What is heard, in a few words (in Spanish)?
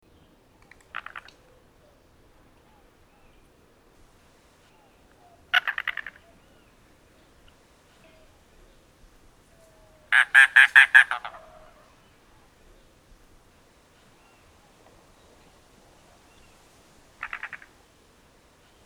cuclillo de manglar coccyzus minor